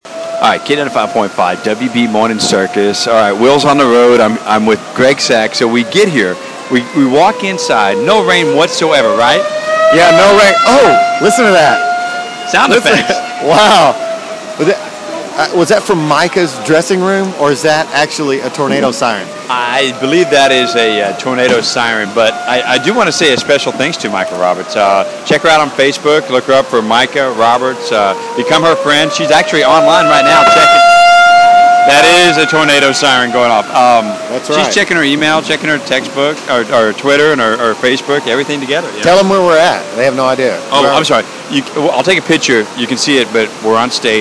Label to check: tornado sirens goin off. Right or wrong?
Right